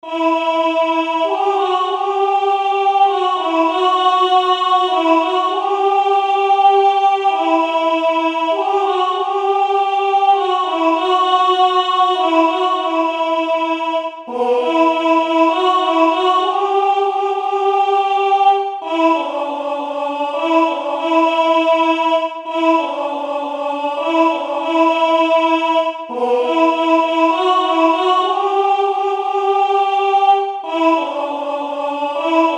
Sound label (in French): basse